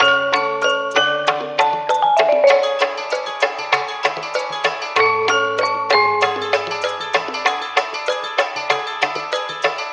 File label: keys, jazz loops